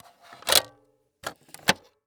Electronic Machine CD Player Placing CD Into Deck And Closing; AdobeCC